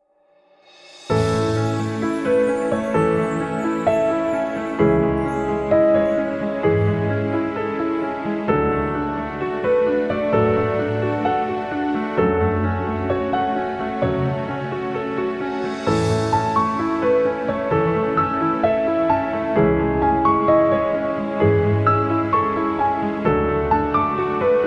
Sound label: Piano Romantic